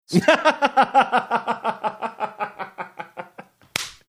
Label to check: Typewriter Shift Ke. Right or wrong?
Wrong